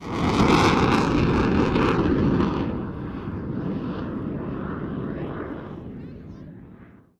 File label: Drop Ship Take Off; Harvest Massive Encounter